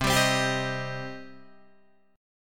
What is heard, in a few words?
C Minor C down Guitar Open D